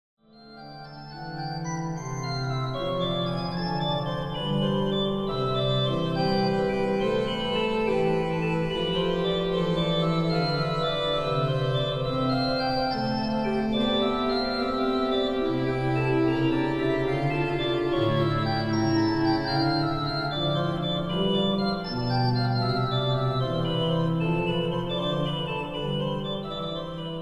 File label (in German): Choralvorspiel; Choralvorspiel "Vom Himmel hoch, da komm ich her"